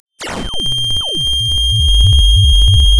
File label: pop